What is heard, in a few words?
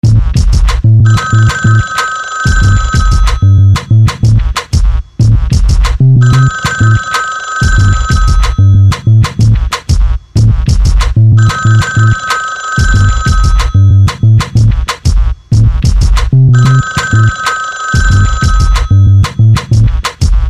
hip hop ring